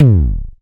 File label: Kick, Lel UDS